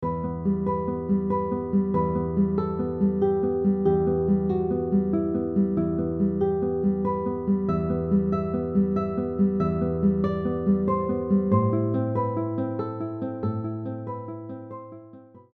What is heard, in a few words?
guitar track; Spanish Romance